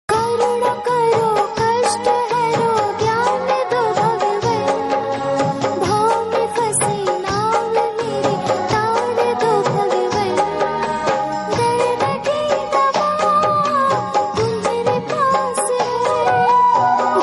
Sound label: female version bhakti hindi song